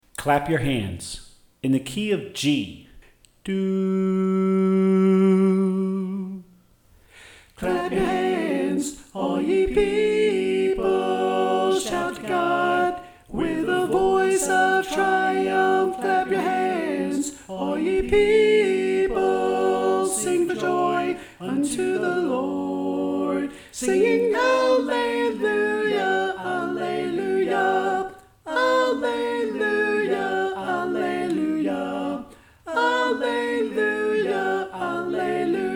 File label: Alto